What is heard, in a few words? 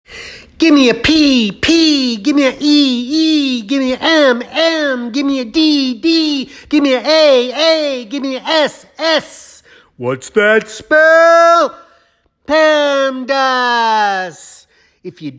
PEMDAS Rap